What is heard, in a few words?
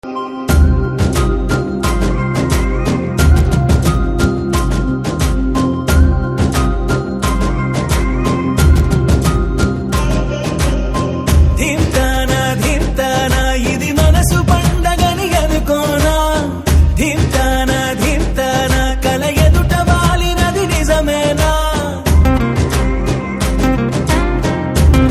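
background music Telugu Ringtone; Sashi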